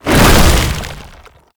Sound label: tank infested melee swing; Enemies; Abilities; Lasrian Tank; Warframe